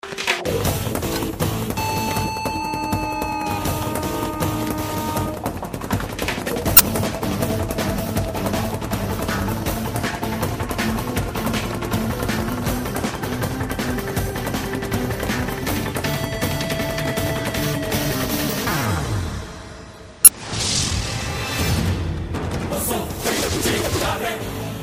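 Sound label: Train background music, Telugu Ringtone; Pokiri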